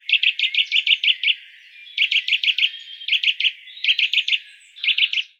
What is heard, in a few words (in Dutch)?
Boomklever Veluwe roep